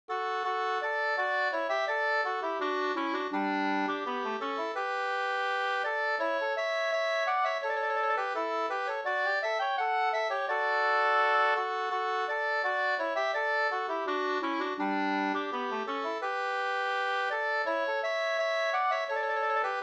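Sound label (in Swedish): brudmarsch Klovsjo brudmarsch Jämtland 2 stämmor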